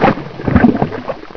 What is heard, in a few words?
sack; video game; source engine; hl1; gonarch